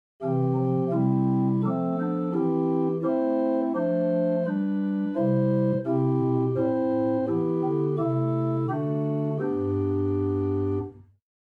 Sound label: Quintadena 8, organ; Buzard Opus 3